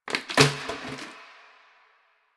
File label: Janitor Plastic Bottle Water Drop; Warframe; Ambience; Nineteen Ninety Nine